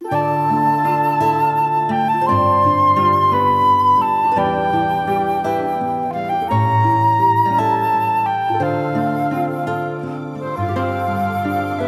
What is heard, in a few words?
Bgm dungeon wonder forest